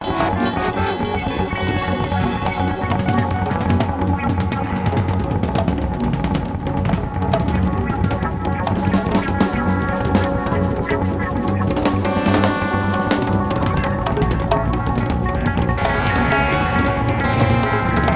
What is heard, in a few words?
Drums Jam